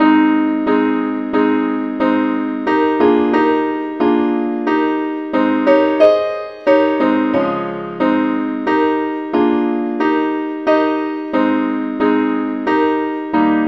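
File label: simple acc, key A flat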